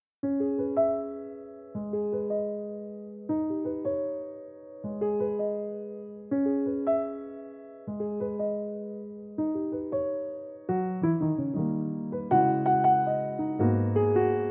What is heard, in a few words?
Piano Version